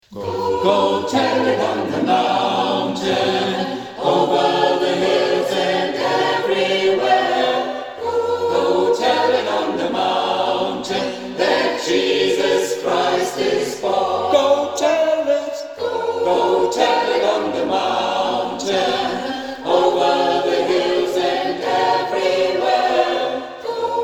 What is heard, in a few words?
Tutti; Go Tell It To The Mountain